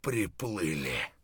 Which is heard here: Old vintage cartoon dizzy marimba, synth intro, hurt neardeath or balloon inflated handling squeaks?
hurt neardeath